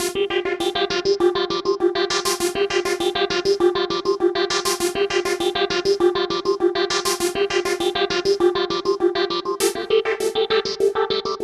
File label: drone; Spirit of Discovery